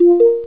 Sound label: complete; Sphere